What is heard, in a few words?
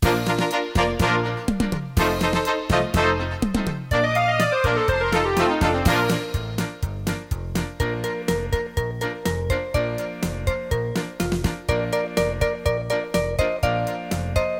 clarinet